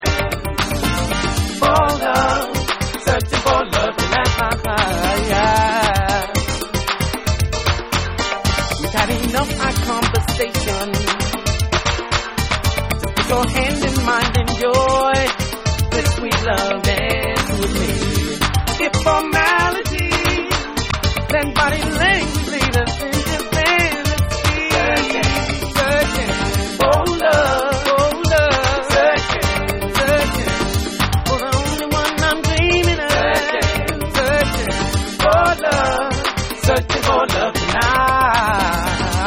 Persussion Vocal Mix

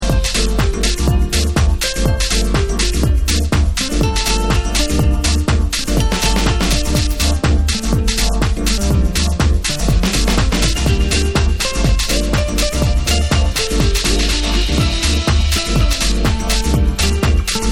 Guitar Dub

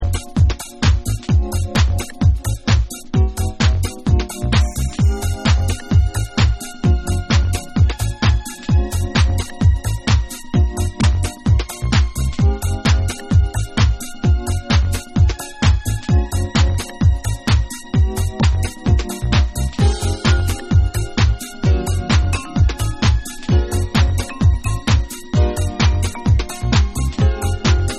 Original Instrumental Mix

